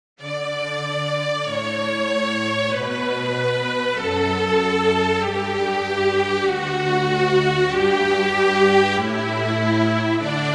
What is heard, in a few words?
Canon for trio of 2 violins and cello